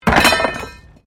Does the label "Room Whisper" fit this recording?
No